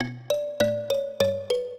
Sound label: minuet, mbira; Mozart